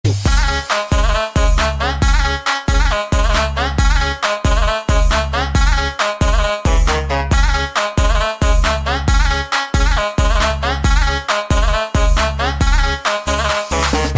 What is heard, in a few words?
Arabic MIX